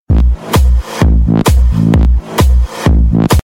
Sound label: Sms